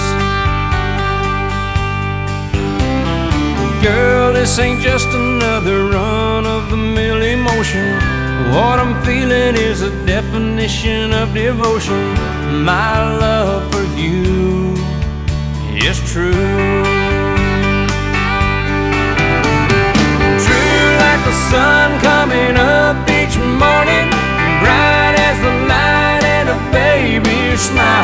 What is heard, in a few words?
country